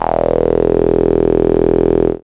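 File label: synth buzz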